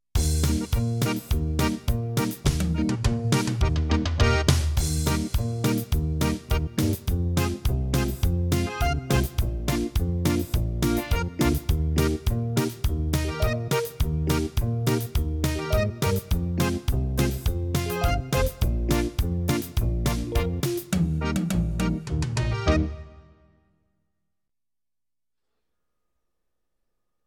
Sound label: oom-pah band 105